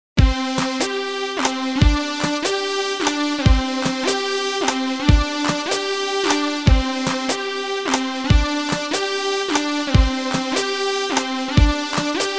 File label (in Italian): Idea ritmica